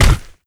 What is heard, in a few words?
queen foot; Attack of the Radioactive Thing